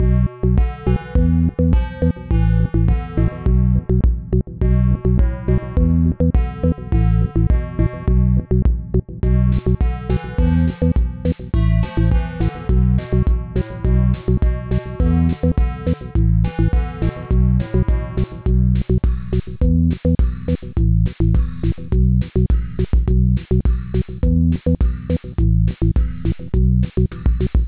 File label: mellow synth